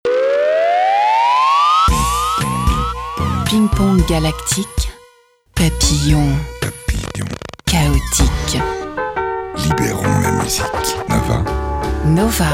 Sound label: Radio Nova Jingle; Nova 25 ans - 2005